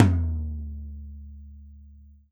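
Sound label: 14 tom, Drums&Percussion; E-MU Producer Series Vol. 7 – Old World Instruments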